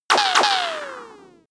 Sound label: ricochet